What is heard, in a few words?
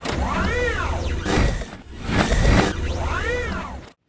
Guard Peace idle; KSokumGuard; Mob